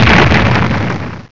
Explosion

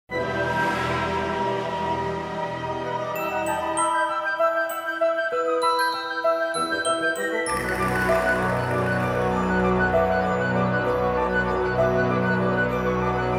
Music BGM Cutscene

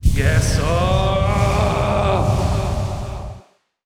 Roar; Nailmaster

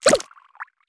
Grab Wall

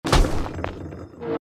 Walk; starbites

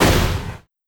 Energy Conv Energy Flash

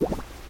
Obstacle Knitted flowers Disappear; In Obstacle Knittedflowers Disappear

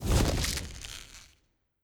Dis Stalact Form

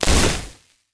Hit Air

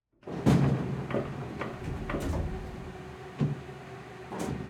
Intro Pod Open Start

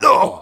Male Hurt; Mars Sortie

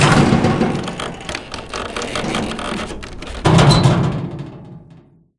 Sfx Basketball

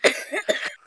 Cough; OpenRCT2

